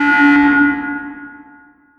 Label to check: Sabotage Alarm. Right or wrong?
Right